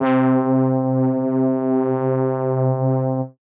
LEAD Brass - C, Synth One Shots; Toronto

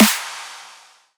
Snare

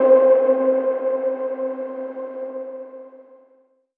KEYS Hardware Noise (C); One Shots; Cymatics; Mystery Pack Vol 4

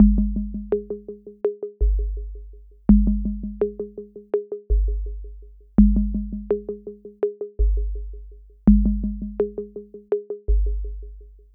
Percussion Loop - 166 BPM; Altitude; Cymatics; ANALOG EVOLUTION Beta

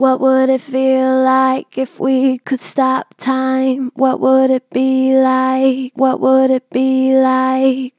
Str Vib, Natural Vibrato Strong, Vocals; What would it feel like; Harmor Vocals

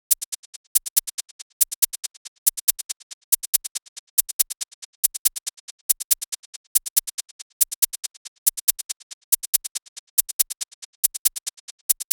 Drum Loop - 140 BPM Shaker; Footwork; Experimental Drum Loops; Cymatics; Mystery Pack Vol 4

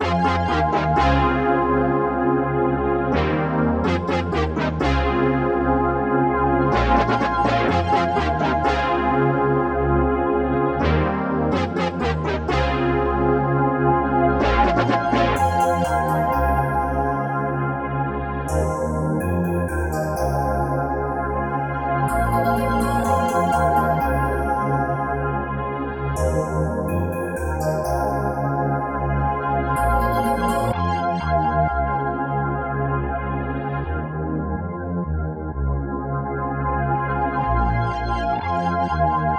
125 BPM F Min; Cymatics; Zodiac III Beta Pack; Zodiac III Production Suite

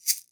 Motion Shaker; Lofi

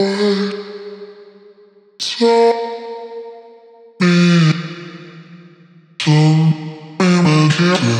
Vocal Loop Cm 120BPM; Vocals; GhostHack; Ghosthack - Advent Calendar 2022 - Day 12 - Vocal Loops

